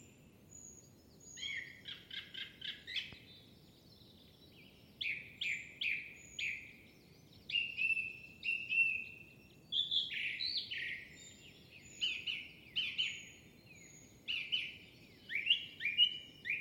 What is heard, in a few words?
turdus philomelos